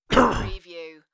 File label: Male Cough; beanstalk audio